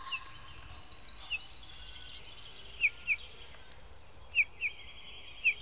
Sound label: pyrrhula pyrrhula